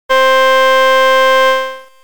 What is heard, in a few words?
church; envelope